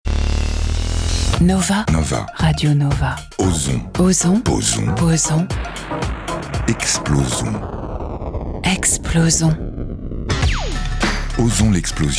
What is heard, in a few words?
Radio Nova Jingle; Nova 25 ans - 2004